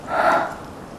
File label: breath heavy